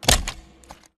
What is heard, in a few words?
melee; EMXArms